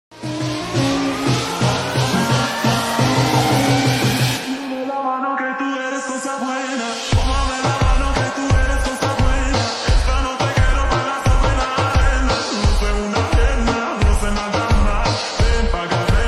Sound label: slowed reverb